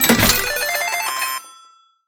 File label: slot win